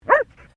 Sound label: Animal Dog Border Collie Female Bark; Various domestic dogs; Animals